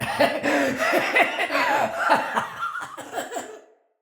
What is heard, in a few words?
Laugh; Encounters; Medis Gyon